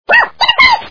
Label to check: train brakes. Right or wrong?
Right